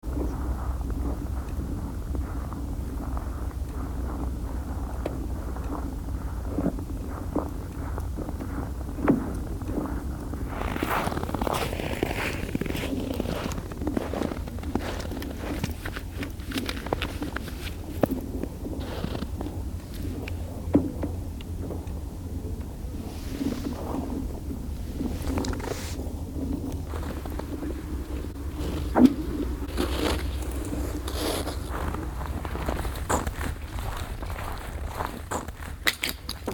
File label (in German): Eisspiel; recorded in Riga, Latvia, during winter 2006/2007.; This aural document is an excerpt of a fieldtriprecording